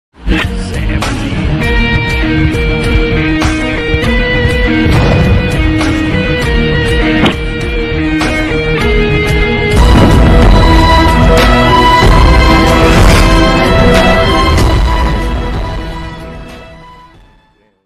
bgm action ringtone; saif ali khan

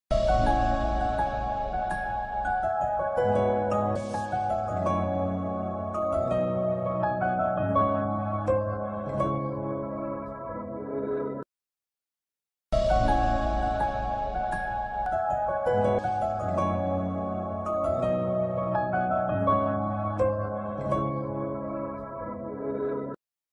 Pakistani drama background music; kaffara

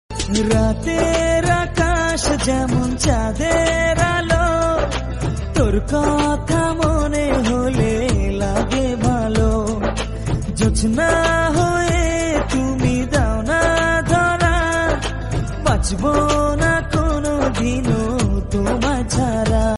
bengali ringtone

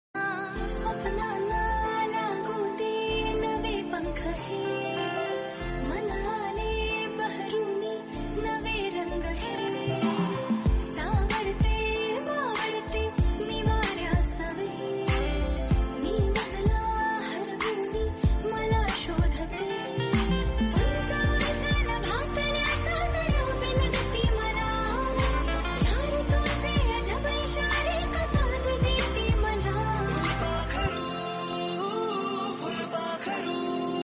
title song ringtone, Marathi; phulpakharu; love ringtone

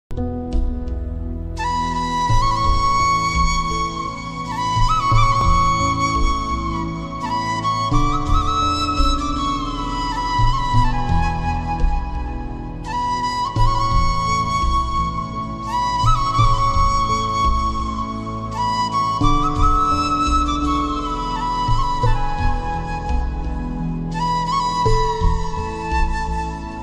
flute song; bombay

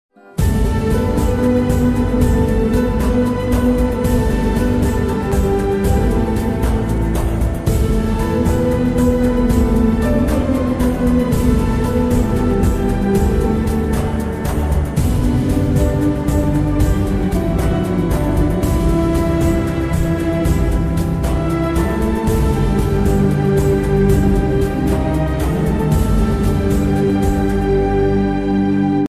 ringtone lofi song slowed reverb

